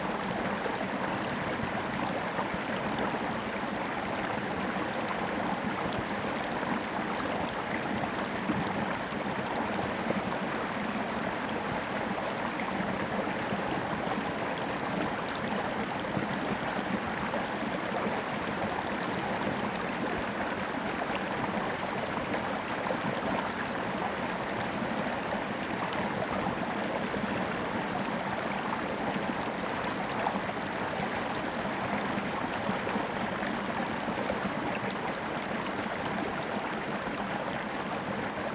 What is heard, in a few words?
River Water Close; Rain Forest; Nature Sounds; Rain Forest FX 125